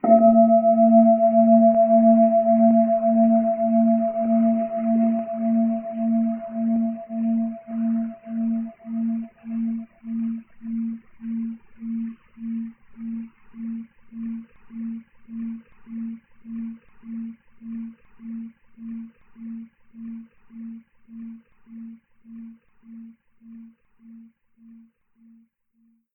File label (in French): bol tibetain